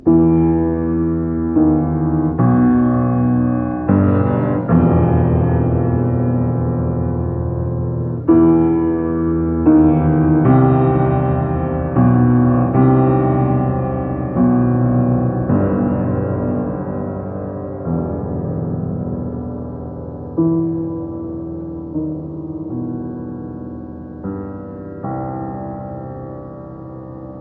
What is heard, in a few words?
piano; improv: Piano